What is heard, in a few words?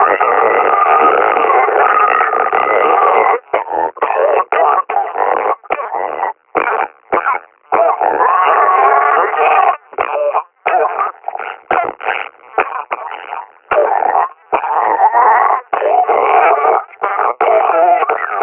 breathing, combine officer; Half Life Alyx